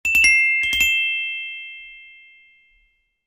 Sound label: Ring, ringtones; Lumia Ringtones